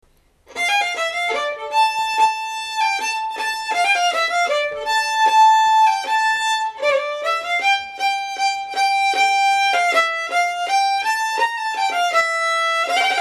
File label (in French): Air de Gayant Melodie, violon